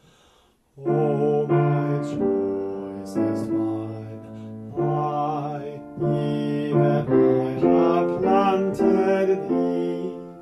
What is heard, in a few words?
bass